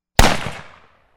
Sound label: enfield; WW2 Era Rifles; British Enfield No.4 Mk 1; 10 meters; Vigesaa Productions